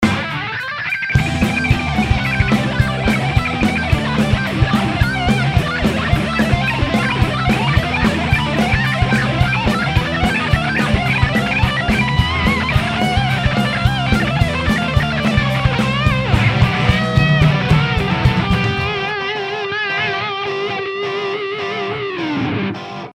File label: solo